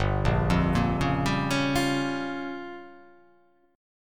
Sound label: G Minor 7th Flat 5th